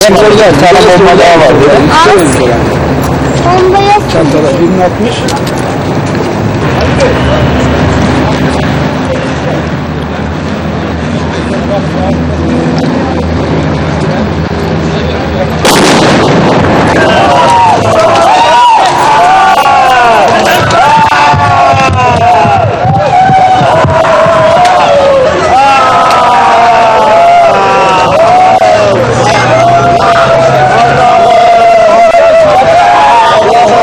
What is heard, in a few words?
bomb disarm goes wrong